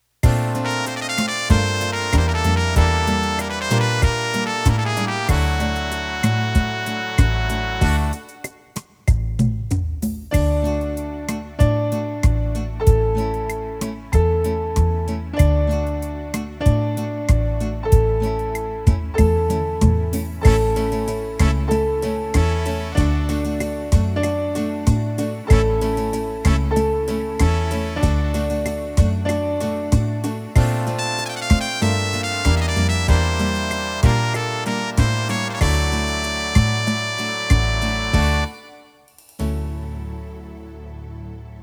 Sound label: Rock Tango